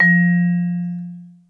VIBE F3, VIBRAPHONE; Greytsounds VOL-1

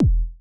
kick, Roland MC-909

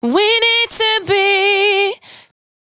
92bpm Bb, Velvet Vocal; Zero-G

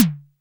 Snare, Clavia Micro Modular

